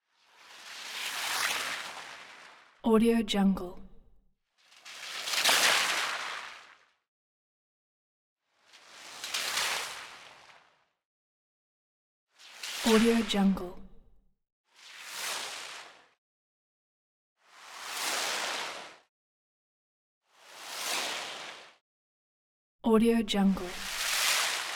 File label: wet slides hissing water pass by